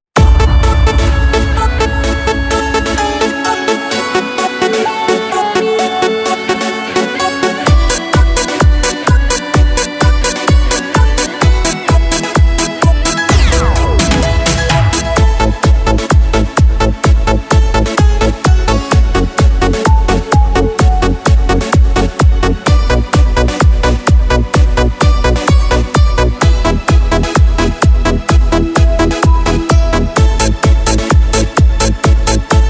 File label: Korg Pa3X